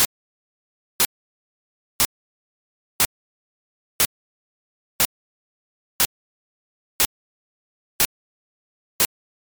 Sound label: burst noise